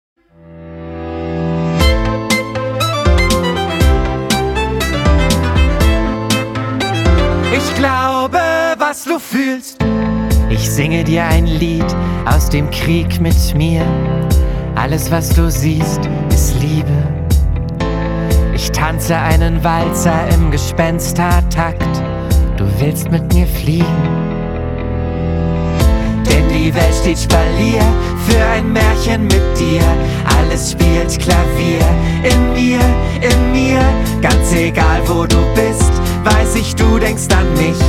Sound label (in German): Alles spielt Klavier